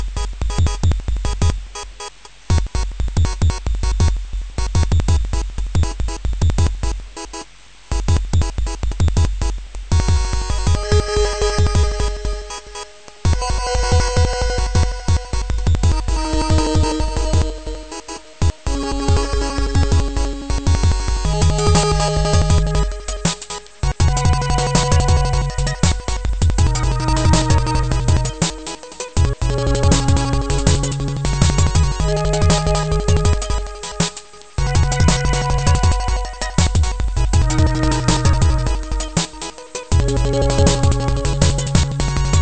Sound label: PCM 18000Hz mono; glasstile5